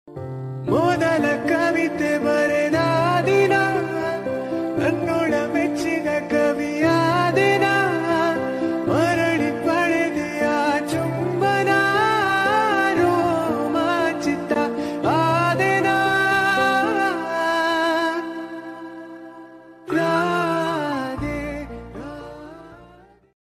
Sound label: kannada love song